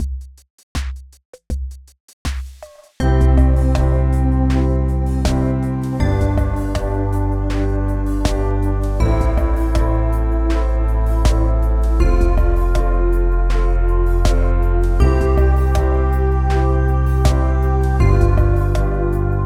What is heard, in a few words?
4 Chord Song